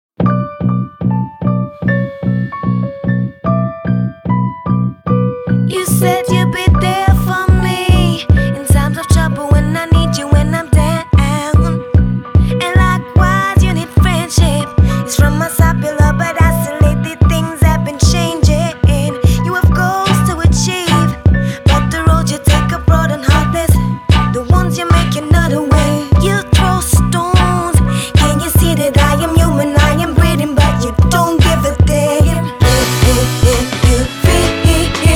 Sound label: heartbeat